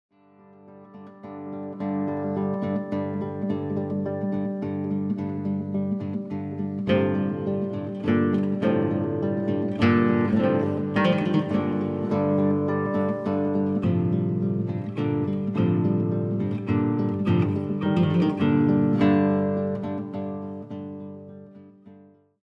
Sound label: Arab Dance